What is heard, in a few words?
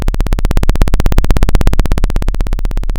pop, chainsaw